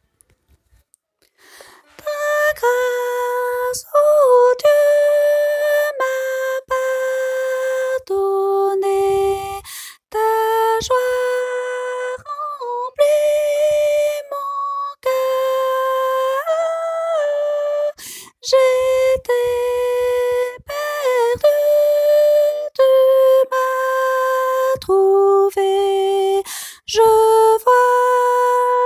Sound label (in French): Tenor; 166 – Ta grâce ô Dieu m’a pardonné